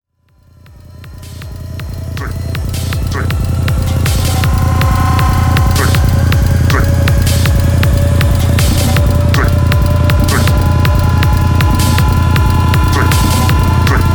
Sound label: cauldron; wizards